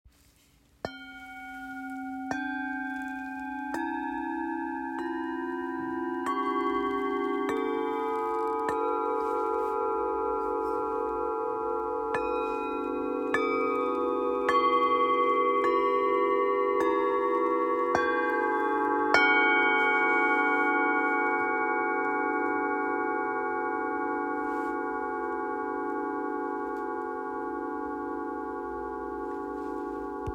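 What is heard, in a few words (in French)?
Set, bols chantants tibétains; intuition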